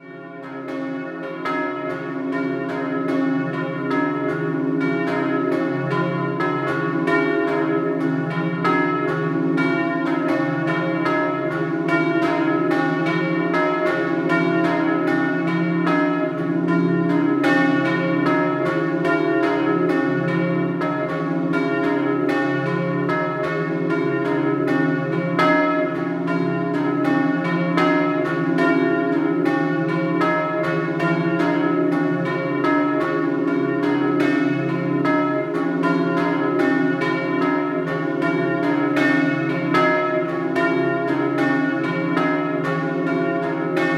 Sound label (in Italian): Plasencis Doppio, campane